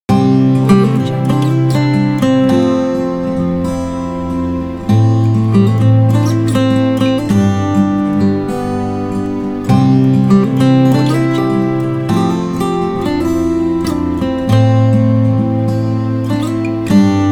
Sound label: the acoustic guitar loop